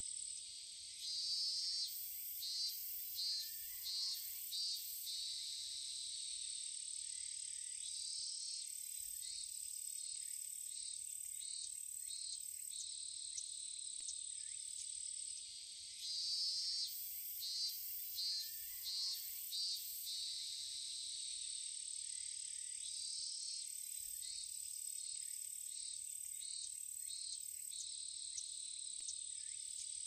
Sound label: the Anime Sound